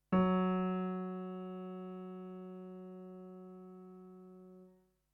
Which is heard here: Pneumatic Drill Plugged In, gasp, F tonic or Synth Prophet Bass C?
F tonic